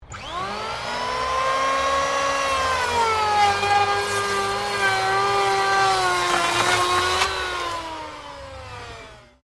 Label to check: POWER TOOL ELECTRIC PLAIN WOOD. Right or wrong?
Right